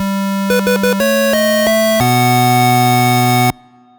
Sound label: 8bit victory